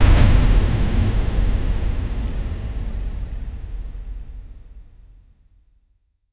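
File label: heavy rain and distant thunder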